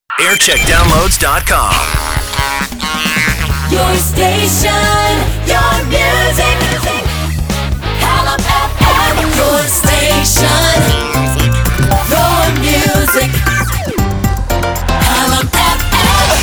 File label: jingle montage; hallam